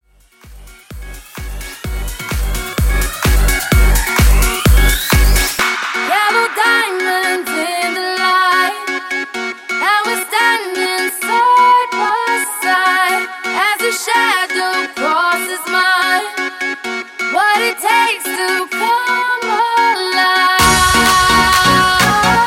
Funk Bootleg Remix Clean

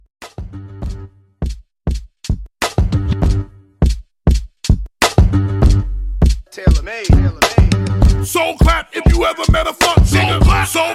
100 DIRTY

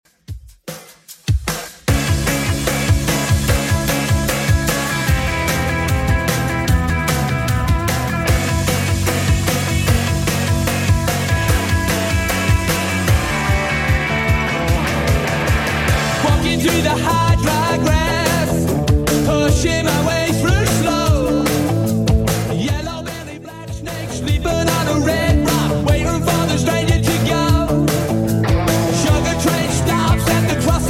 80s Redrum 150bpm

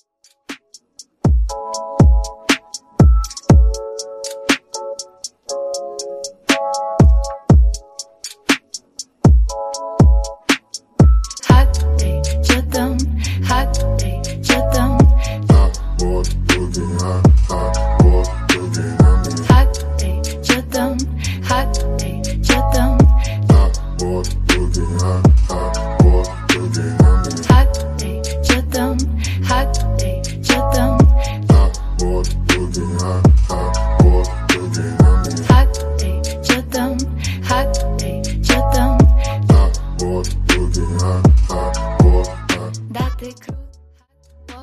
Mixshow Intro 60 Bpm Clean

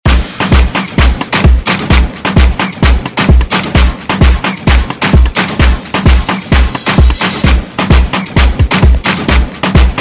Afrobeat Hype Intro

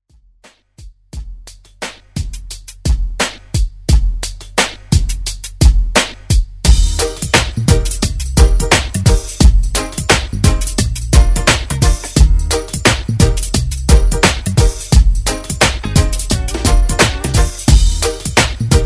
Reggae Quick Redrum

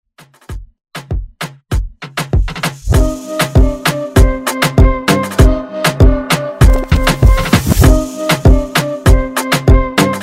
Moombahton Redrum

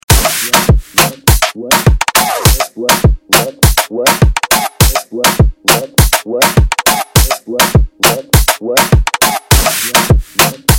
Moombahton; Mainstream VIP

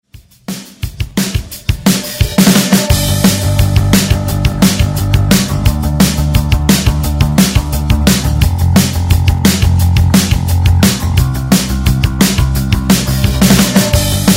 Club Mix

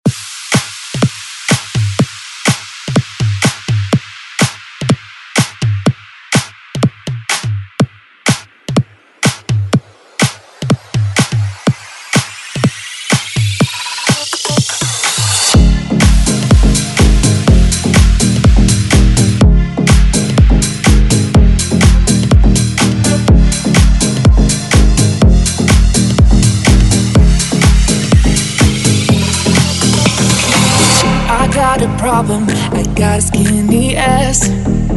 Future House Edit

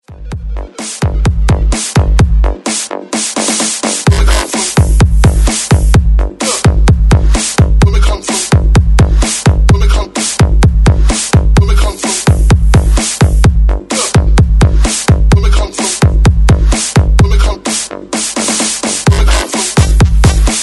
breakmix 130 bpm

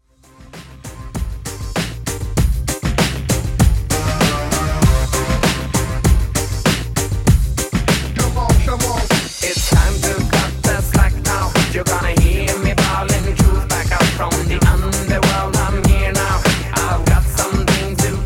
Party Redrum 98Bpm; djyan

